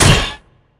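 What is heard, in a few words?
big treasure box damage up; monster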